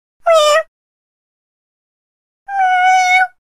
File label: Cat Meows 2 Cute Kitte; animals cat